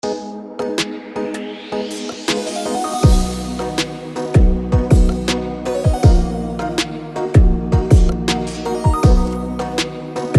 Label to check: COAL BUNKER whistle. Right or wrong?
Wrong